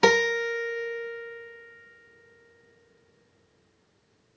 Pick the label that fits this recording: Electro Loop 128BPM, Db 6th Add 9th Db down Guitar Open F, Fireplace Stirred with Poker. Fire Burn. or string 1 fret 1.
string 1 fret 1